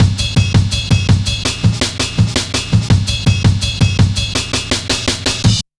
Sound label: Samurai, Drum Loops, 165.5, Jungle; Zero-G Jungle Warfare 1, 2 & 3